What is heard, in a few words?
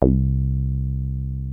303 C2, TB 303; Hollywood Edge; Giorgio Moroder Rare Synthesizer Collection